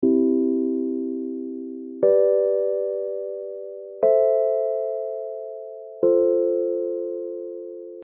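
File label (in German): grundtöne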